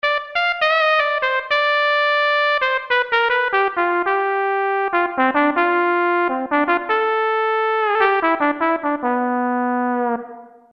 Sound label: trumpet